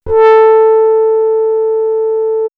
Swirls Horn, pads; X-Static Goldmine

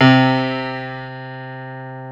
PIANO C2; Techno Trance; E-MU Formula 4000 Series Vol. 2; Orbit Presets

